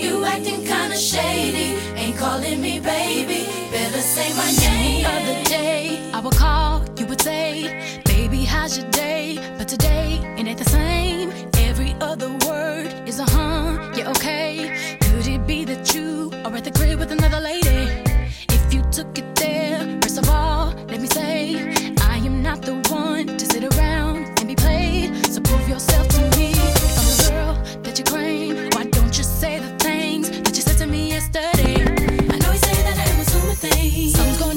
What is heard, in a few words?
Commercial Jazz; sommarshow 2026; showmusik; dans